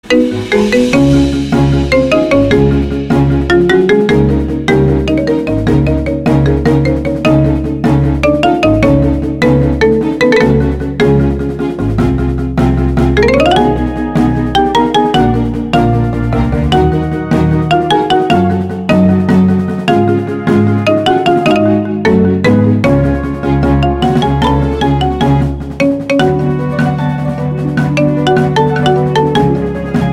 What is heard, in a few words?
marimba